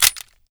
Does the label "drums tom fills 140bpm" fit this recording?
No